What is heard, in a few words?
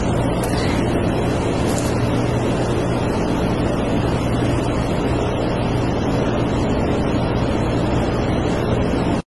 sigh; audio meme